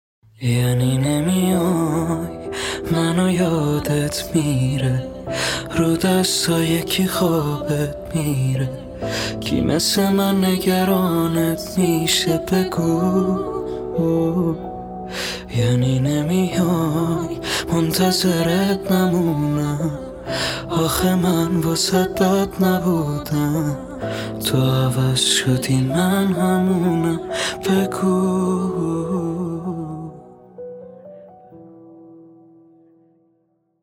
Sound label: PIano